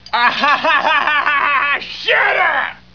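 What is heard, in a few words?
laugh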